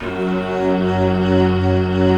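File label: F#2, JAPAN FLUTE; Best Service; Advanced Media Trax Modern Composer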